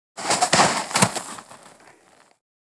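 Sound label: body falls into snow; Parkour; Butterfinger Video Sound Effects; Additional Parkour Sounds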